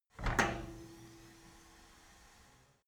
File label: cleaning machine open handle